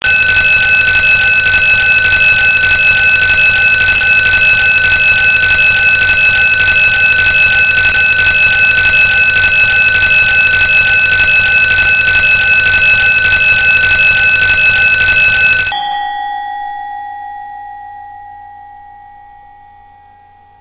Mechanical School Bell; 20 Seconds

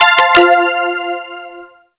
All Clear

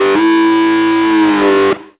Shift Change

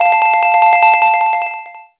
School Class Change

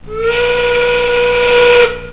Quitting Time Whistle

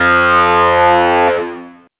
Evacuate

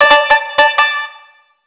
Internal Emergency

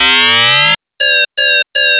Lockdown 3 Pulses

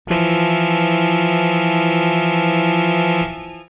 horn long

